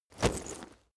leather metal impact

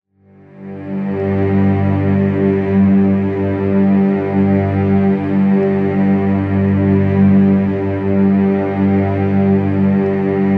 dark string octave

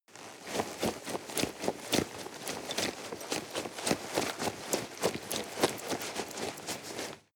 nylon leather run sequence

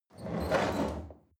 chair wheel roll on floor medium squeak

